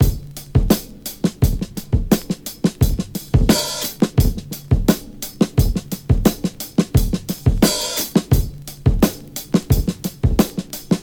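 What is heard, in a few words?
87 bpm drum loop sample d key, kick tuned to the D note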